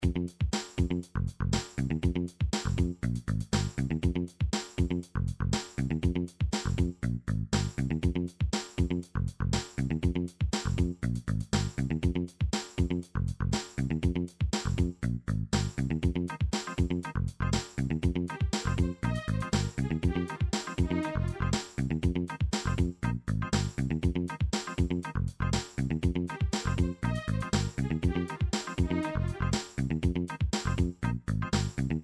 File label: funky groove